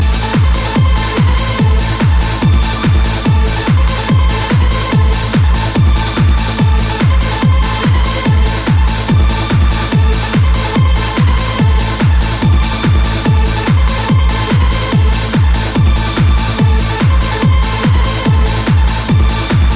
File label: Bass Terror